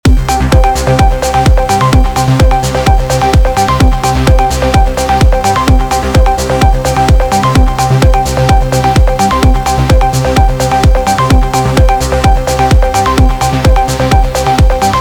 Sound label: only p5